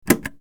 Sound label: door